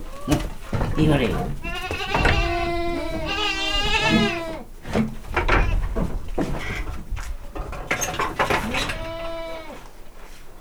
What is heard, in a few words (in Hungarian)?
kecske ólból gidák kizavarása; állathangok; paraszt udvar; házi kecske